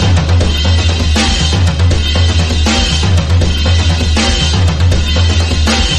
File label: drums; horde